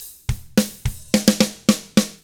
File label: POP ROCK, Double Platinum Drums; 109POP ROCK